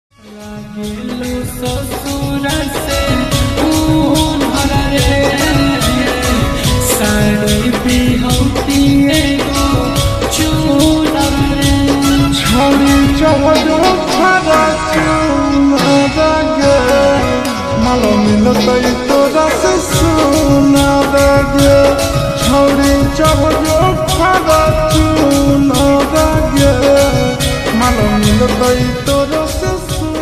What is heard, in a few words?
Slowed Reverb Ringtone